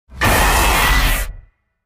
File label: jumpscare; shadow bonnie